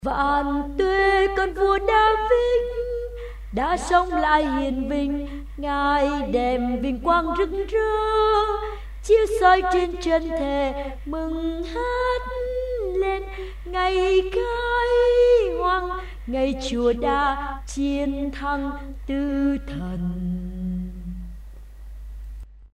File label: alto basso